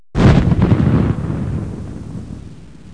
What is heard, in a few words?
TANK; MOBICLIC37; EGYPTE; modele labyrinthe